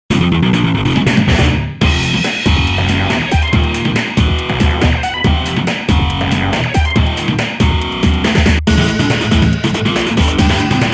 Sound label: Hard Rock; Fate Stay Night Soundtrack Collection; Fate Tiger Colosseum; Fate-Tiger Colosseum Upper Original Sound Track